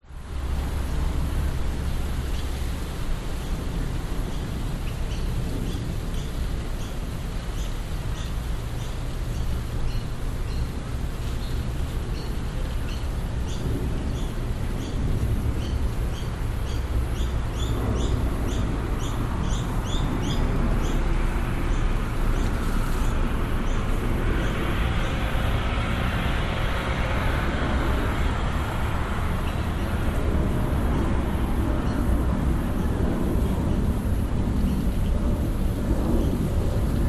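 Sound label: public places suburban ambience summer australia near airport jet take off distant; birds traffic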